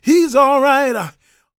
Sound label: GOSPEL MALE; Spectrasonics Vocal Planet